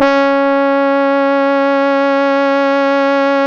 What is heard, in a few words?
C#, Synclav FM, BRASS; Big Fish Audio